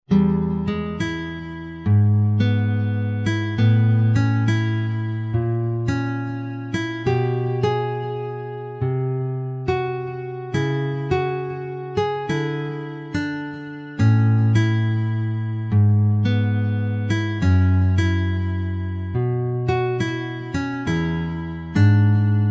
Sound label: bluesy